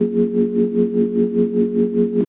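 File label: Pad Synth; Equipped Music; Loungin House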